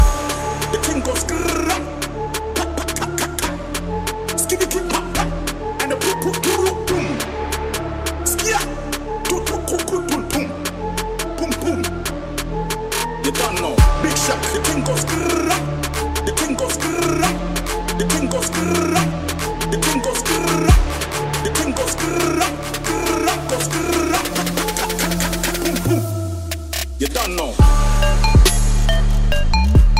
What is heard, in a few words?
Epic Remix